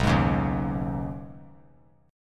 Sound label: B 6th Add 9th B, chord; strummed